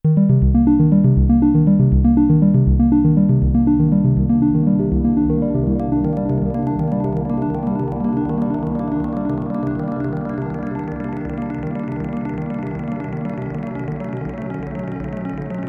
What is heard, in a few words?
Modal A8 Pad ARP